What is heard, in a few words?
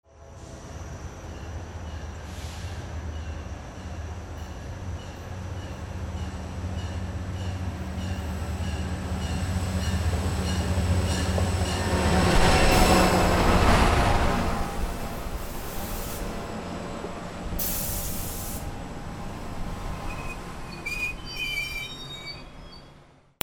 TRAINS